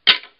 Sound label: goat smack; minecraft; technic